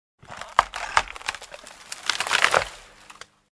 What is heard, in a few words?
Creaking Plant